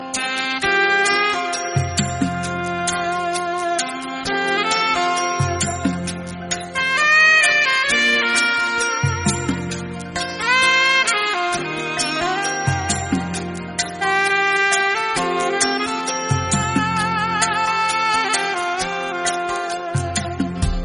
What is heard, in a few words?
jazz instrumental ringtones